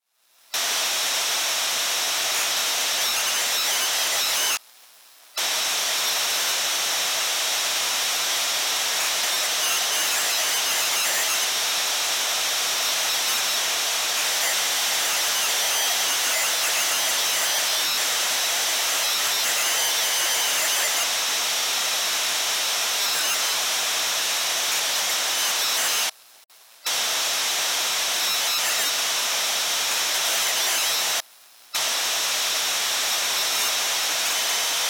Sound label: Science Fiction Communications Distorted Radio Signal; SFX Bible 6